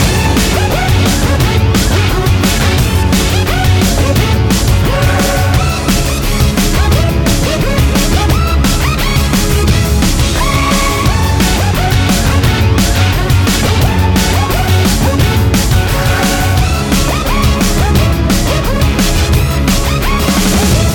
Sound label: Dubstep; Odessa Dubstep